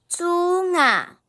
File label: decay